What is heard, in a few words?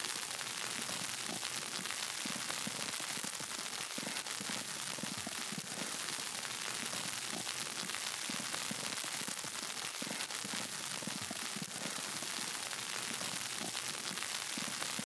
Fresh And Light Snowfall